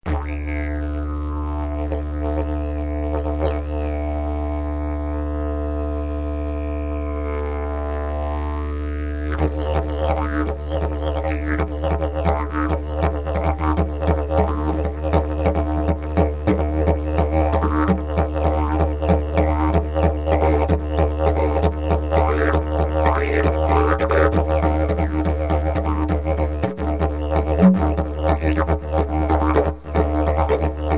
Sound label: yidaki